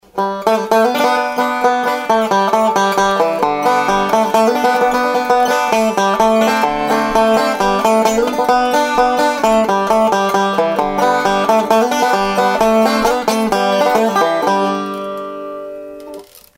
banjo

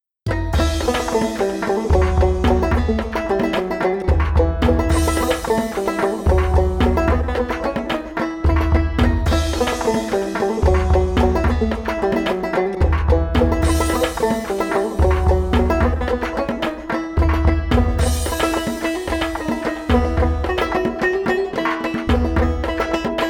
oriental, banjo

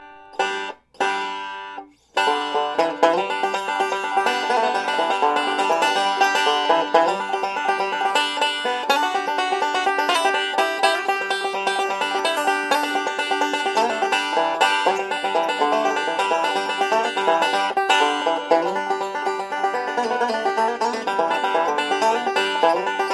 banjo

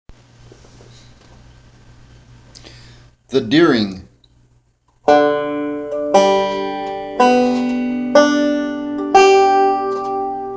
3 banjos

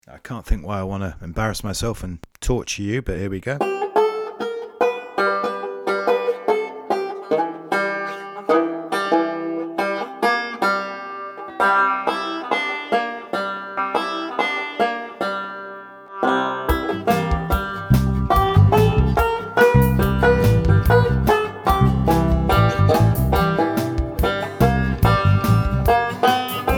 banjo

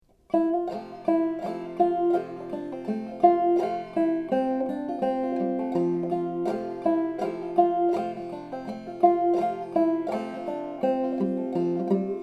clucking h, banjo

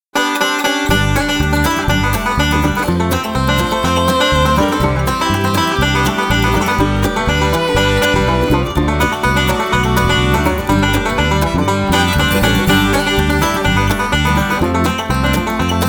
gibson rb, banjo

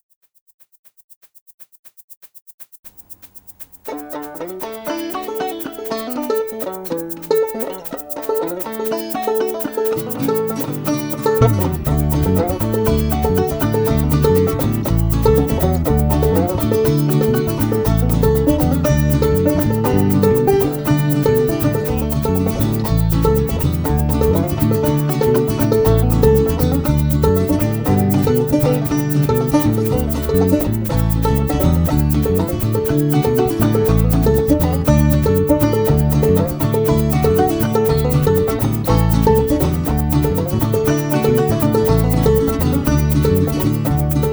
reggae, banjo

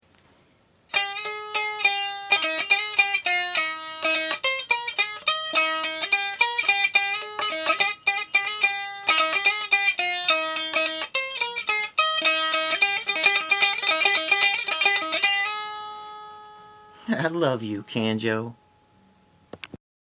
canjo

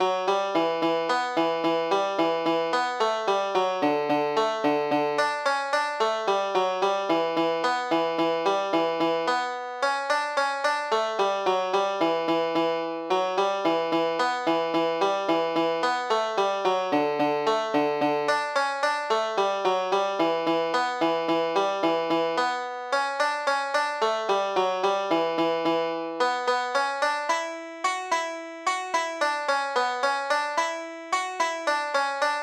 swallowtail jig, banjo

